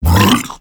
Regurgitate Cast; Warframe; Grendel

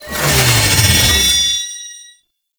Dash Charge Up; Warframe; Lasrian Soldiers; Abilities; Butcher

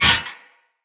Melee Impact Metal

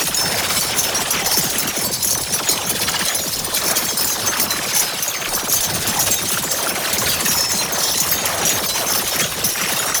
Chain Laser Loop; Roathe Summon Catenach; Warframe; Tau

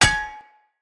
Hammer Impact Shield; Weapon Impacts; Player; Combat

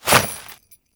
Jump; Warframe; Grineer; Royal Jester; Foley; Jumps

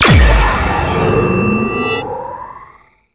Heavy Slam Ability Charge Up; Warframe; Duviri; Dax Heavy; Abilities

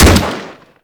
Rifle Shot; Warframe; Tenno; Tenno Drake Rifle

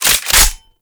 Reload, Weapons; Warframe; Braton

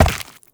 Step Flesh; Warframe; Uriel

